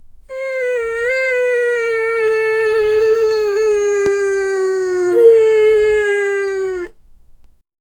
the sound of a girl, zombies

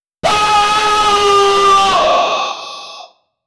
soul boss death sound; anguished

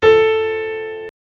a discordant piano note; atonal

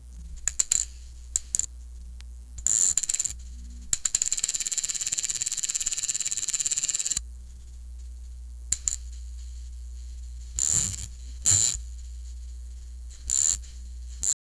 a reel to reel movie tape

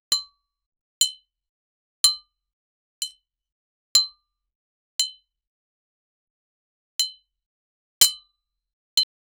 tapping on glass or metal

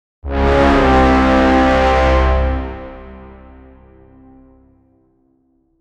epic battle horn sound, heroic

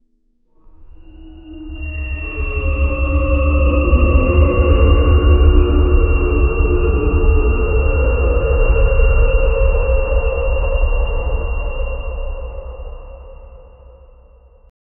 create the scariest and m, creepy

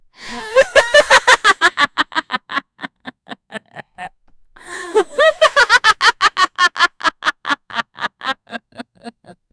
a woman laughs slyly and

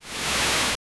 8 bit

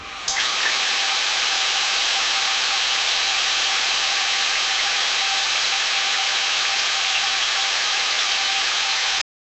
bunch of farting sounds, bathroom

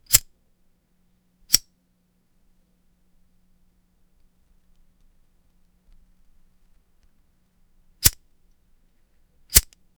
fire

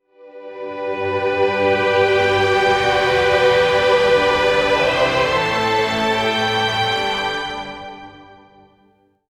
bright orchestral fanfare, ascending melody

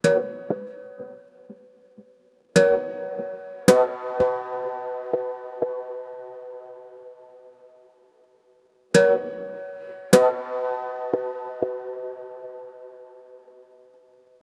background light ethno, ethnic

